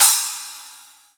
Splash, drum machines; 300 Drum Machines; E-MU Orbit-3

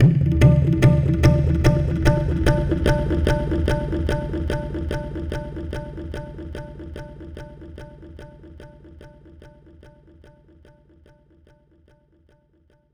Tabla

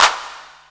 CP, CLAPS, House Side Of 2 Step

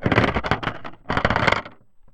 Percussive Hit, contact mic

